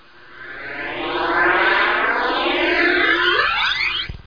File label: Transporter; CyberNation 1.11; Arcade